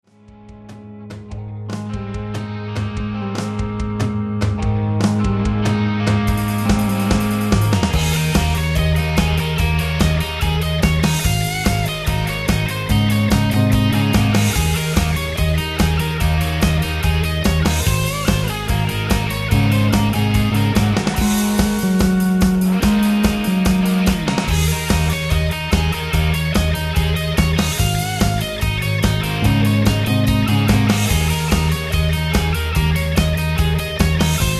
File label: strat bridge axe ac30